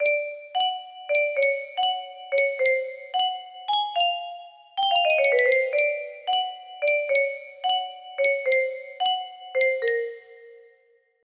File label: lullaby, melody